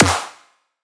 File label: Snare